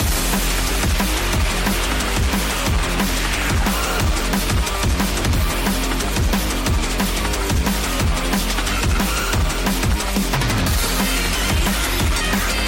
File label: bgm